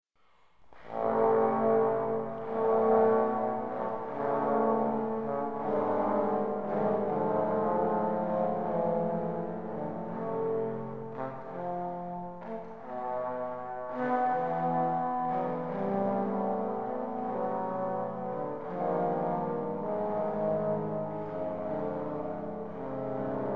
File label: piano winds intro; stravinsky